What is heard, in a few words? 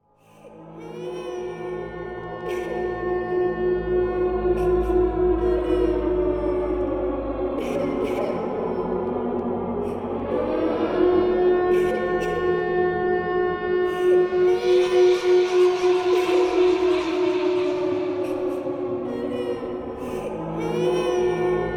the creepy war atmosphere; will give you goosebumps